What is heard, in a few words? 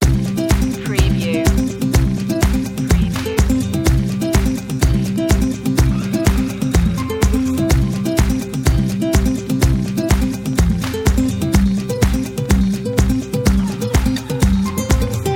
folk coachella loop